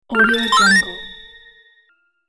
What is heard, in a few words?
bonus collect award win